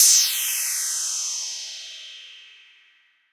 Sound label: big room crash sound G key, Royality free crash tuned to the G note. Loudest frequency: 7587Hz